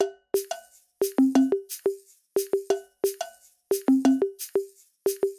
Latin RnB Loop

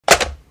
Hanging Up Phone

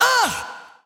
Grunt Ugh

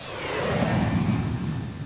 Missile Fired

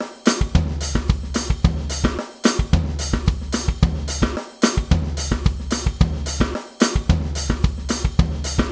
Latin Drums

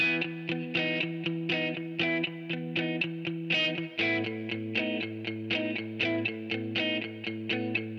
Pop Music Guitar

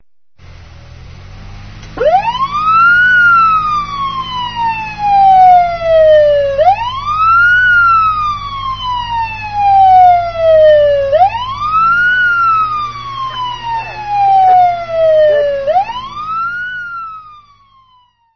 Police Car Sound

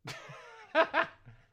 Man Laugh

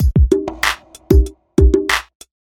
Hip Hop Beat

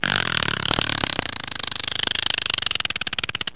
Frog Sound

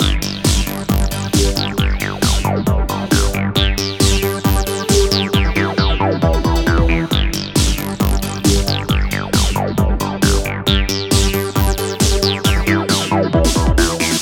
Hot Acid Trance

